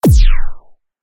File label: kill; ninja cat